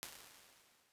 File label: Reverb, impulse; UVR resources